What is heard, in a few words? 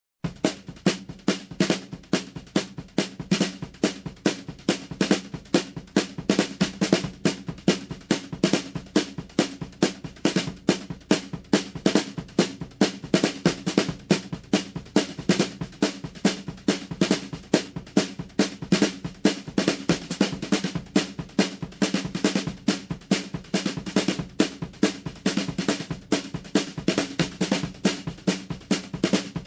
Fast country drums